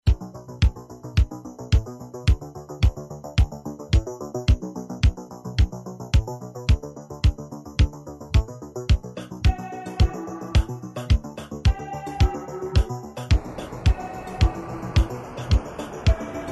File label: noise; Virtual Hard Mondays Volume 2 Edition 15